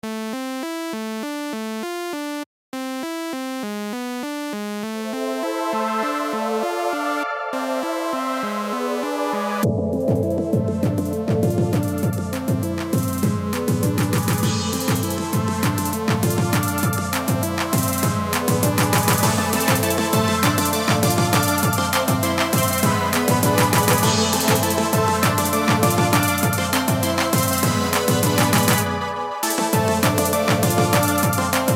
ביט מגניב אלקטרוני

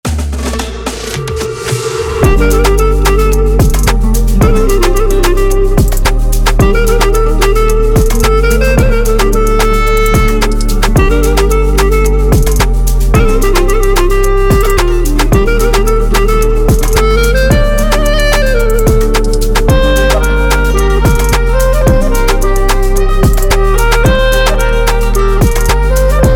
אפרו ביט

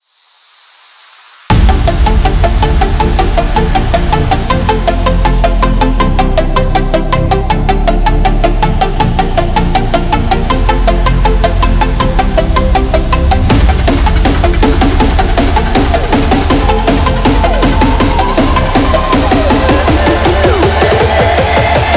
טראק דובסטאפ edm

